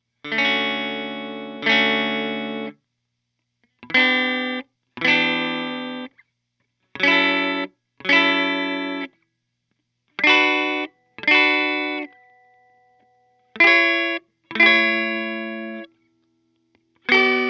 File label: D Seventh Harmonized; guitars; More Otto Mods